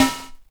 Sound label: Modular Steel Pan Vinyl Synths C3; vinyl perc